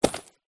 purse pouch leather containing coins and notes drop carpet; foley